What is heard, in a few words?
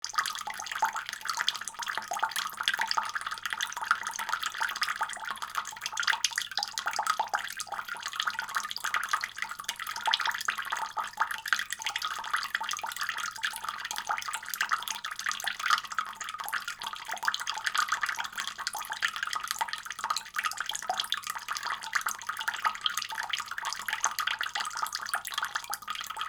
rippling water; nature